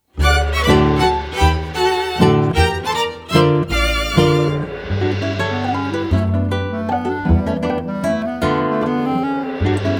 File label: Mazurka